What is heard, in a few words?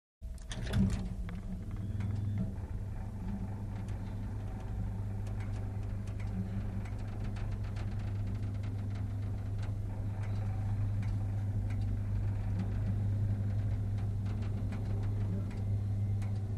Interior Freight Ele, elevators